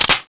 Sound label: deagle slide forward, weapons; valve